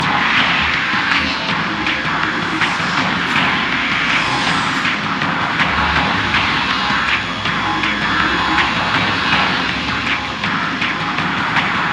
emote dance music; Cyber Ezreal

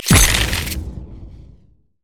stacked deck; LoL; twistedfate

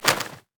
ravens animated win; LoL; emitters map8; odin

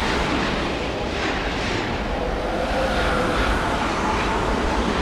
spectral loop; LoL; yorick

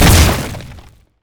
landslide; LoL; glacial malphite

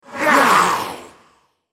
spell cast effort; Thresh; en US

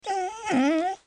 wolf dying; Character Voices; English

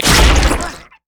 passive chunk crush; LoL; zac base

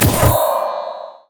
sightward bat; LoL; items

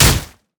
grasping roots; LoL; zyra

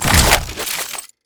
swap; LoL; jinx base

